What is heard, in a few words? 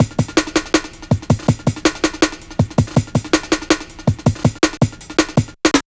Rolling, Drum Loops, Jungle, 162; Jungle Warfare 1&2